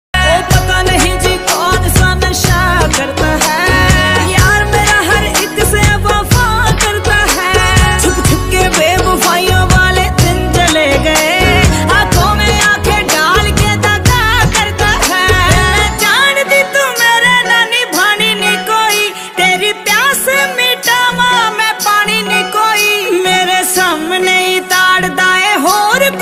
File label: female punjabi ringtone